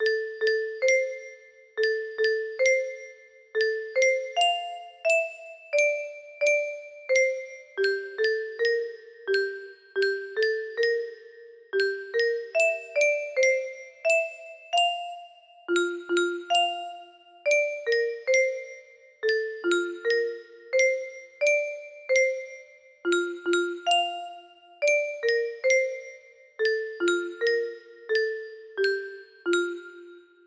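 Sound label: lullaby simple, music box melody; brahms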